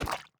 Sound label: ink sac; the monster plane; kolmods skill